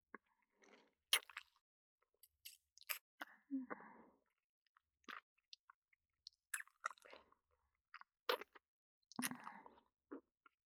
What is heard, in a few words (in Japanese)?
キス